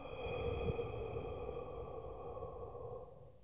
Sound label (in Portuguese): Vooooo, exercicio voooo fantasmagorico para abaixamento da laringe